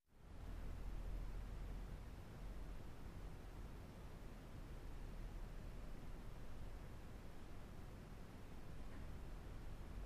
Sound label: Air Fill Room Tone; air tones